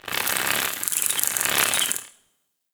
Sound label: Winged Insect Passby; Ambience; Warframe; The New War; Narmer Outpost